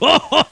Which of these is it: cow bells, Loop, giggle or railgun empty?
giggle